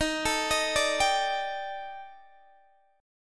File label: Eb Major 7th Flat 5th Eb Guitar DADGAD